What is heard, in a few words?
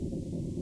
wind